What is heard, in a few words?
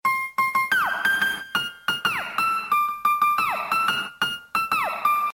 piano beat